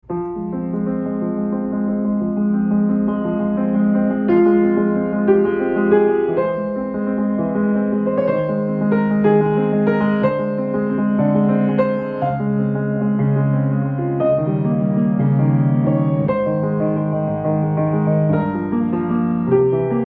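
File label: Piano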